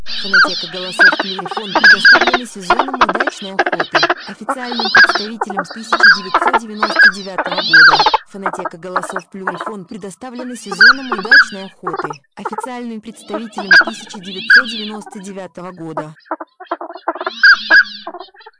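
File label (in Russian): шилохвость, манок; plurifon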